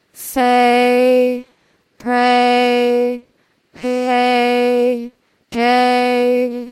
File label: singing